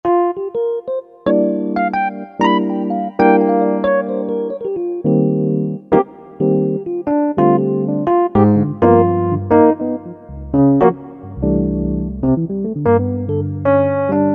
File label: Rhodx; Generalmusic S2R